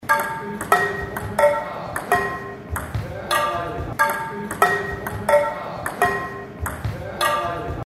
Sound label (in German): Pfannensound; HSV Tischtennis; KitchenCup 2023